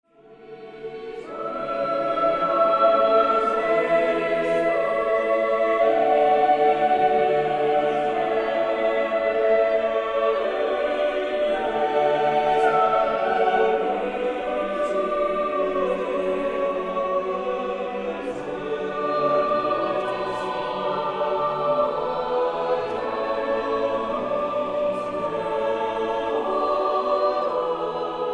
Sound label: Regina Caeli a 8; sacred treasures of spain; london oratory schola